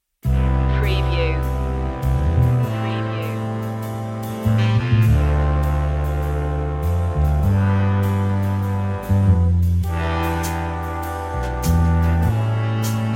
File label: big band romance